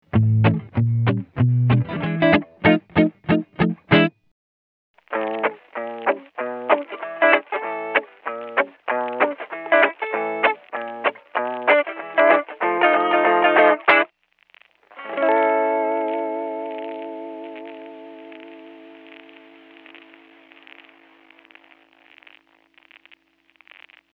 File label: destroyer; mobius